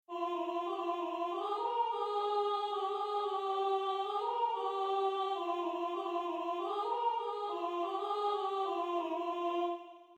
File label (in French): voix